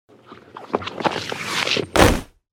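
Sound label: slipped on the ice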